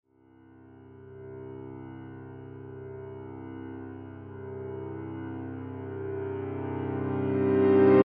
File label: Piano Reverse, Piano Reverse Chord Horror Scary; Pianos; Musical; Blastwave